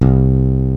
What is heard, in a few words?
POP BASS, PEAVEY DPM; OTHER SYNTHS; Rubber Chicken Software Sample Bank